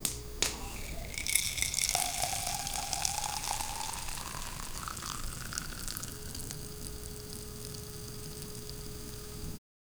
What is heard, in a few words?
powder pouring